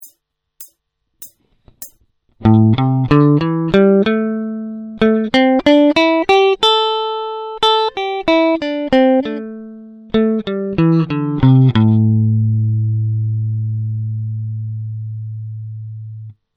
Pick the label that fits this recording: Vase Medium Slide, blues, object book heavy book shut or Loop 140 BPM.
blues